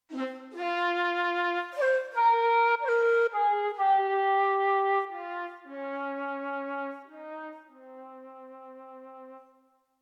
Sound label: Eastern flute, XV-88